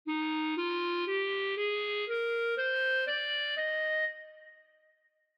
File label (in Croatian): F dur, ljestvice, clarinet